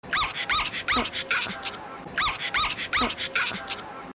bow bow

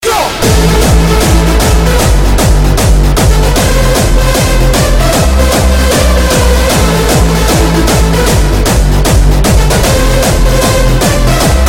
sonar natural; davide

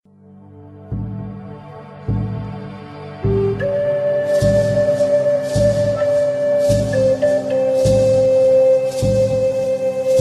native flute remix

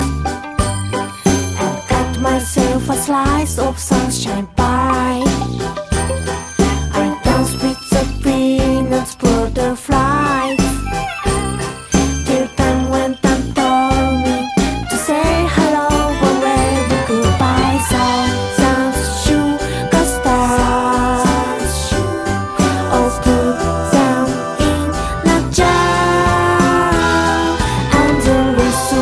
wolf whistling song

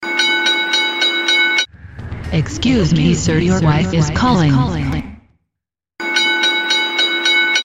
alert wife calling

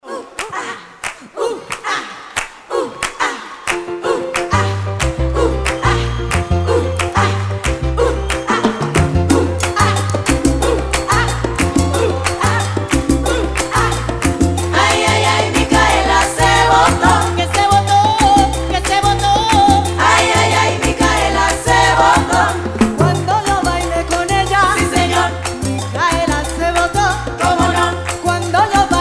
uh ah uh ah

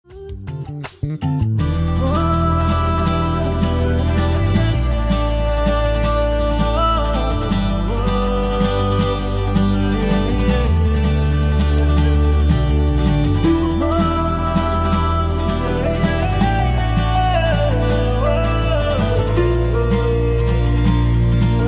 nice tone

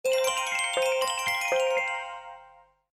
message tone

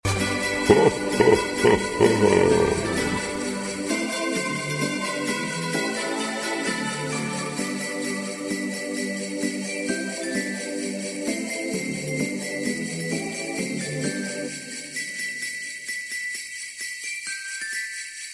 xmas sms

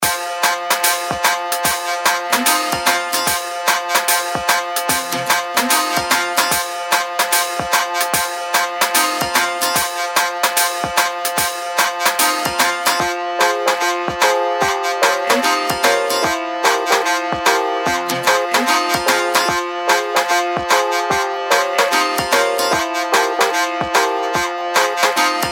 electronic sounds

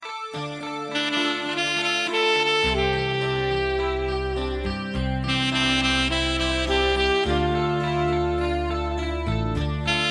loving saxophone

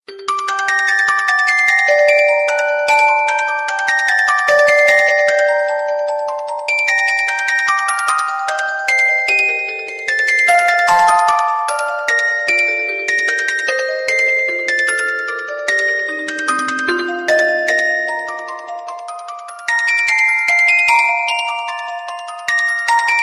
aspired tone

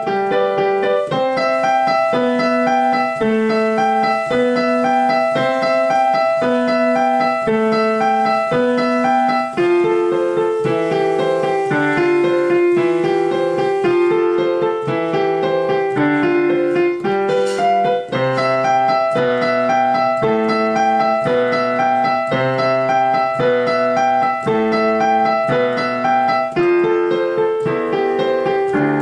piano cover

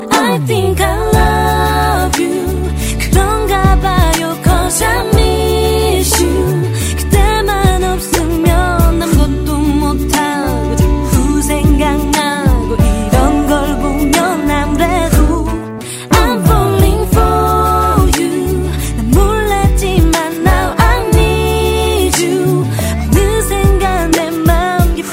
korean song

